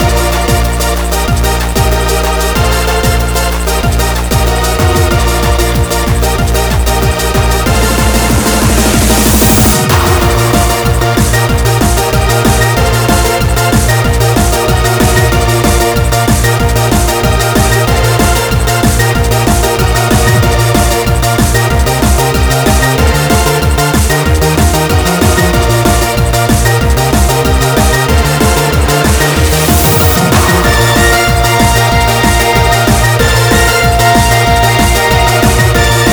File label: Edm Loop